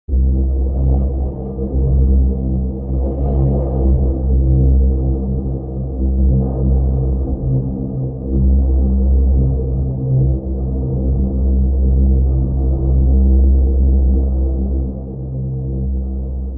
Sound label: High quality thriller-horror ambience sound perfect for building tension and drama; in film or game scene; Calling